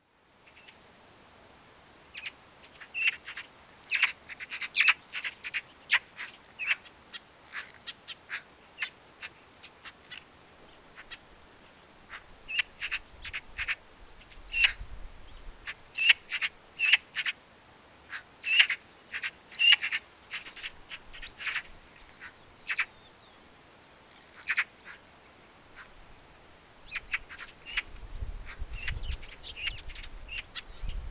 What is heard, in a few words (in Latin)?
Pica pica; SETE